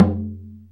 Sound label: CHIMNEY DRUM, ETHNIC DRUMS; Zero-G Ambient 1